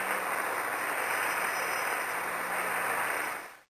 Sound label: static